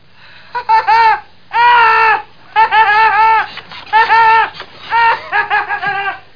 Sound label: pw laugh